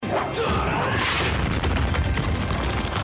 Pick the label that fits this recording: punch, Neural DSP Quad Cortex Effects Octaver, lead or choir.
punch